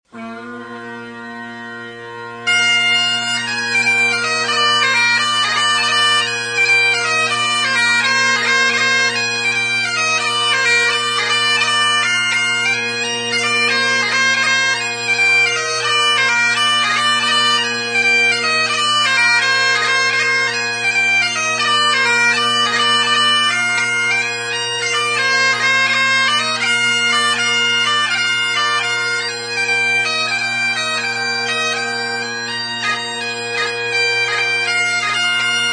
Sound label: solo, piper